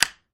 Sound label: menu item